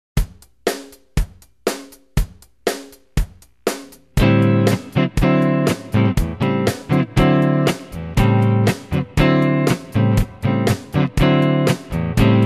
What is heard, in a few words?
Rhythm Guitar